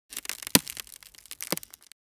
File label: Ice cracking with soft pressure short